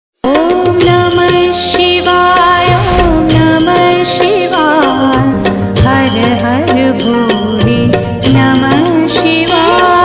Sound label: om namah shivaya mahakal ringtone mahadev ringtone